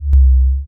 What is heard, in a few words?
disintegrate; rhythmbullet